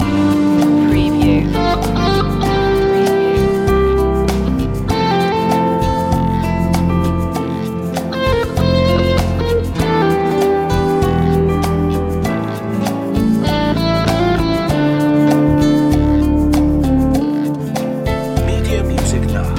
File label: romantic guitar loop